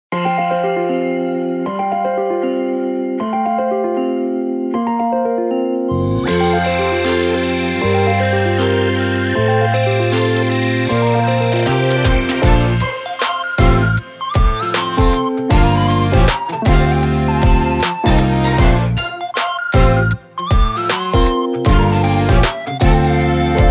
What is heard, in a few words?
bgm